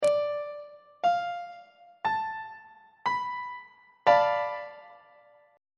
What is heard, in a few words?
a double sharp half diminished 7th 1st inversion on treble clef